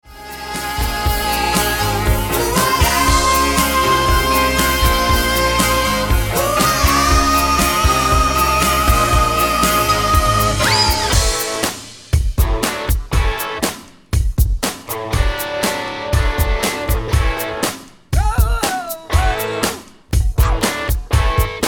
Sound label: 70s Pop Mix